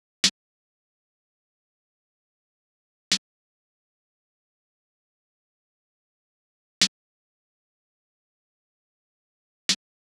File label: Drum Loop - 146 BPM Off Snare; Drum Loops; Loop Stems; Cymatics; Mystery Pack Vol 4; Tighten Drum Loop